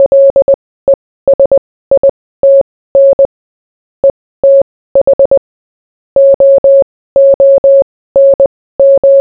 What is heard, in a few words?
hint